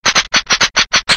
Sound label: dryfire; Defective Turret; sp sabotage factory defect